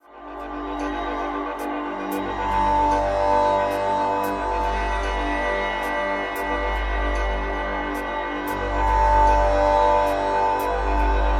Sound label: Music Loop